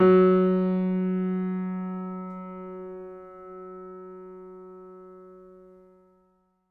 MIX L, Acoustic Piano; InVision Interactive; Miroslav Vitous

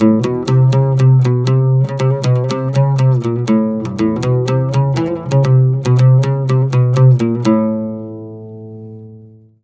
120 oud

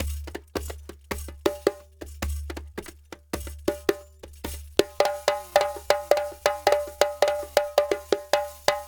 Djembe with Kessing; African; Sonic Foundry; Continental Drift Aftershocks

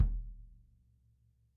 kick

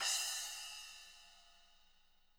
splash, splashes; Spectrasonics; Backbeat

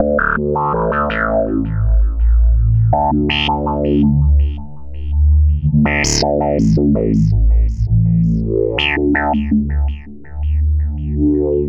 Synth Bass

